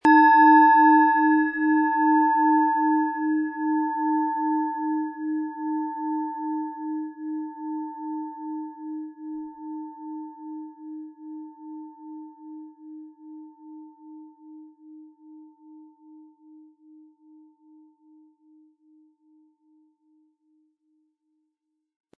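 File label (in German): Planetenschale Eros inkl. Klöppel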